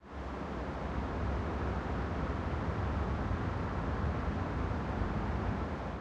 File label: ambient port default; World Of Warships